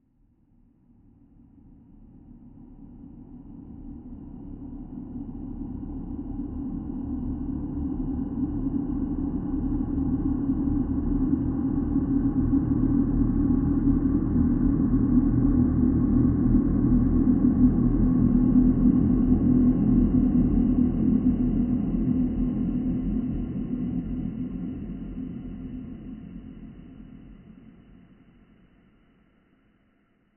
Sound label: Horror Ghost Low